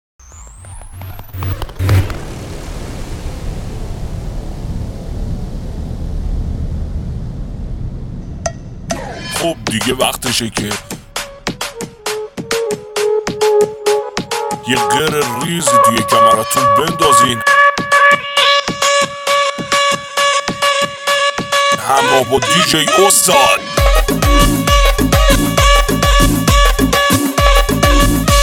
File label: Tribal Lori